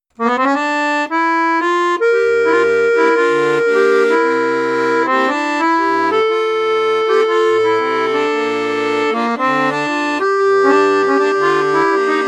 registre basson, accordéon; piermaria prodige

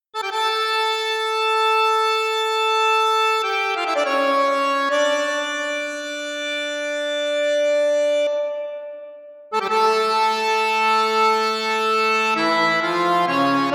registre 16 8 8 4, accordeon; borsini concerto medio

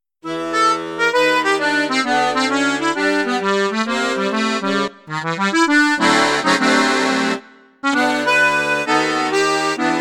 registre flute juste flute haute, accordeon; paolo soprani

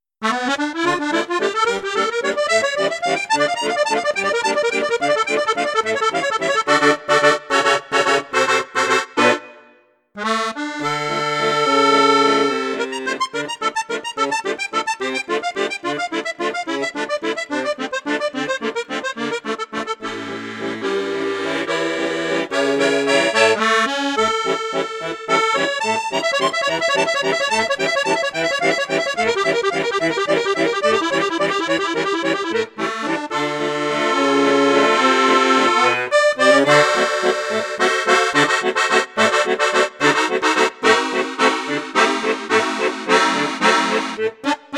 registre flute juste flute haute, accordeon; maugein amusette

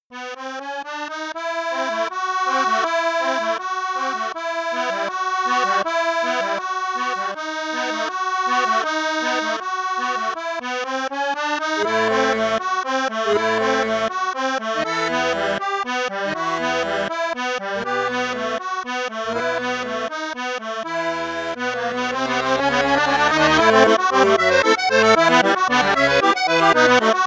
registre flute juste flute haute, accordéon; piermaria 318 l

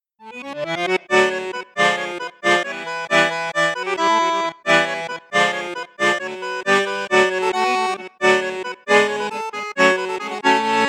registre basson flute juste, accordeon; cavagnolo professionnel 12

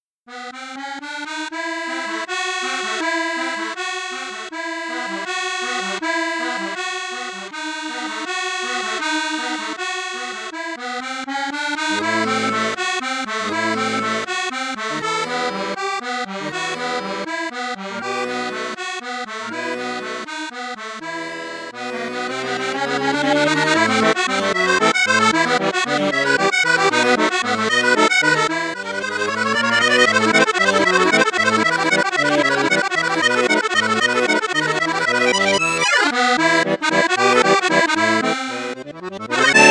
registre flute basse flute juste flute haute, accordeon; mengascini musette

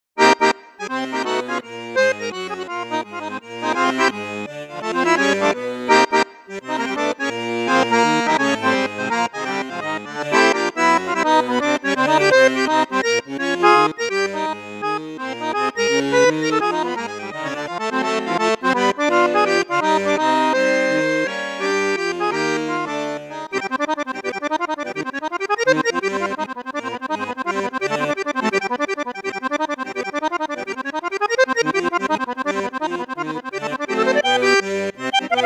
registre basson flute boite piccolo, maugein resonance accordeon; maugein resonance iv

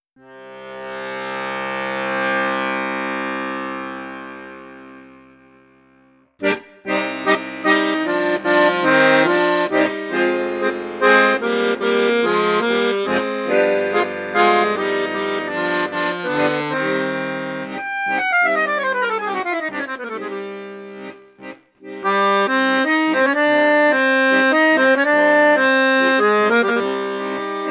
registre basson basson octave, accordeon; accordiola

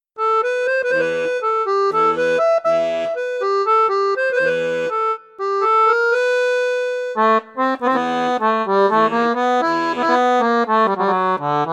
registre basson; fratelli crosio

